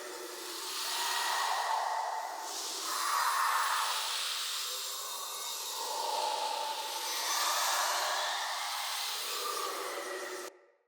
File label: Wolf Howl Buffed Entity Attach Loop; Orengall